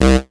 Synth Bass; Vengeance Samples; VEE Synth Shots

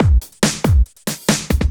Loops BB 140, BreakBeats; Vengeance Samples

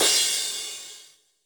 Cymbals crash; Vengeance Samples

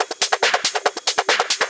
Loop 140 BPM; Vengeance Samples

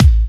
Hard Kicks; Vengeance Samples

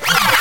FX Scratching; Vengeance Samples

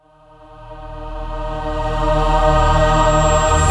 Trance Sweeps; Vengeance Samples; VEC2 FX

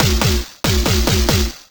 Fill 140 BPM

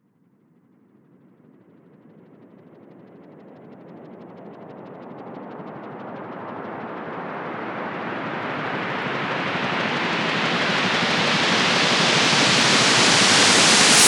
reverse, FX; Vengeance Samples

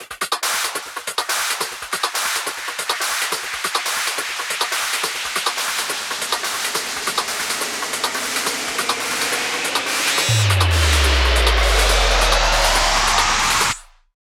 140BPM FX 8 Bars; State; Vengeance Samples; VUF1 Snares&FX Pairs 140BPM

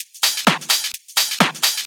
Electro Loop 128 BPM; Vengeance Samples

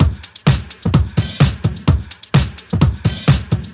House Beat, 128 BPM

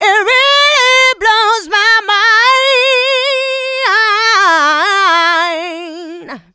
voc, female vox, Vocal House; PS-28 Vocal House Samples

